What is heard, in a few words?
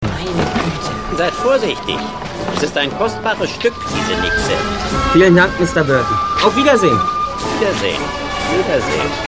thai flute